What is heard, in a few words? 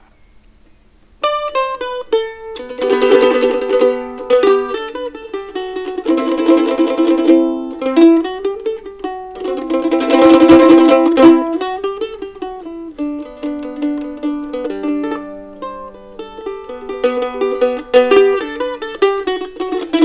Mandolin